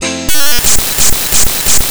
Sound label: pne, piano loops; house; Vocal House; PS-28 Vocal House Samples; instrumentloops